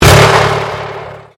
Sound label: Convolution Pulse